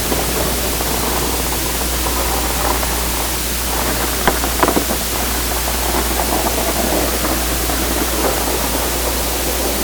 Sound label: furniture moving